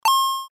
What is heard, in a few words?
over bet win